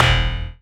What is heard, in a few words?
robotic bass pluck